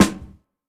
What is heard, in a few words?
subby snare sample g key, snare tuned to the G note. Loudest frequency: 1564Hz; snare drum samples